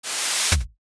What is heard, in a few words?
page turn soft